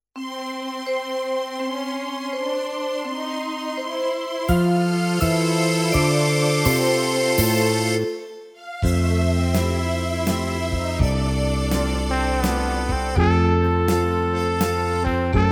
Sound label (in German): Englischwalz; Senioren